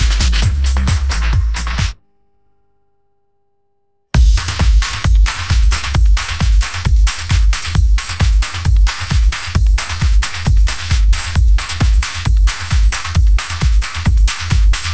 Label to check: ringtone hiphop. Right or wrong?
Right